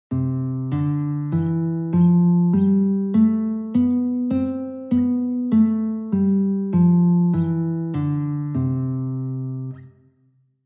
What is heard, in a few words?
C Scale Guitar; California Music Conservatory